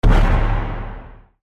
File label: explosion